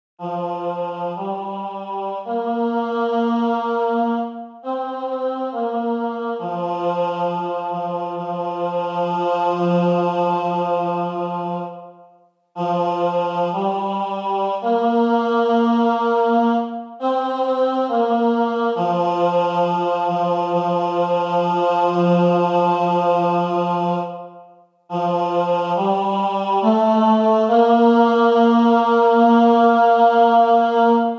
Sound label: Tenor